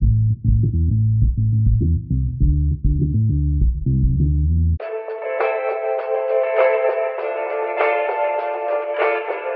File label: guitar, loops; Phonky